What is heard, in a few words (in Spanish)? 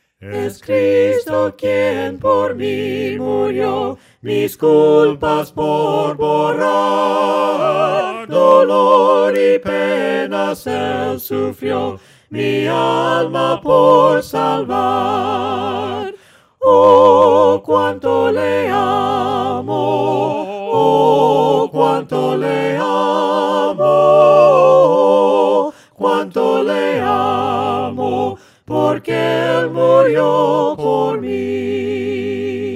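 VOCES, himnos a capella; ¡OH CUANTO LE AMO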